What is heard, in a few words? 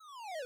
ramp